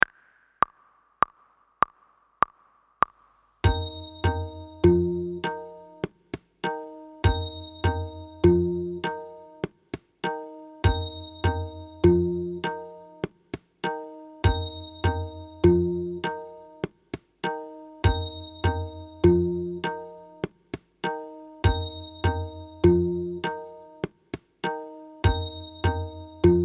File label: Ta Practice with Na Without Tabla